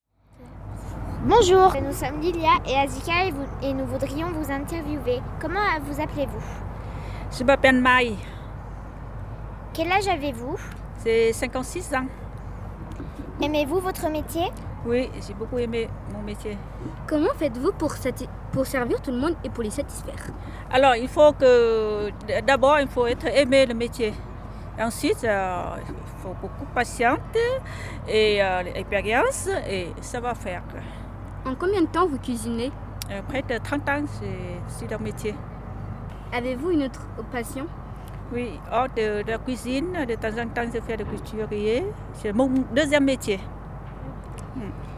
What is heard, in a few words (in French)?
cuisine; Louis pasteur